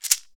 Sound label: SHEKE, Afro Shakers, Shekeres